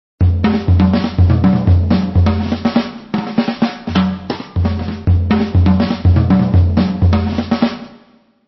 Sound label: fills jazz